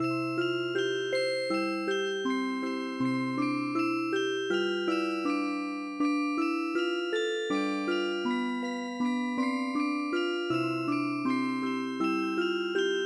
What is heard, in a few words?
Rice-planting song Music Box